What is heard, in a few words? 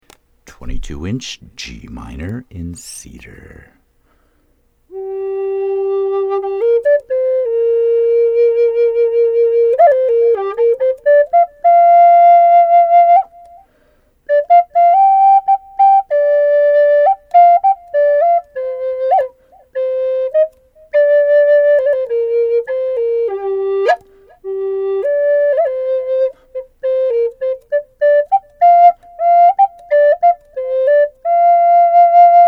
22 inch G Minor Cedar